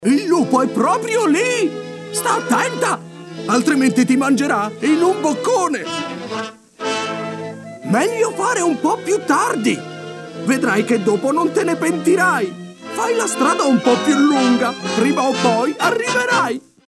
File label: Alto